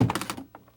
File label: Foot Squeaky Wood Bridge Walk